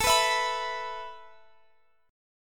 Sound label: A Suspended 2nd A Guitar Standard; x,x,19,16,17,19; Asus2 strummed; capo=3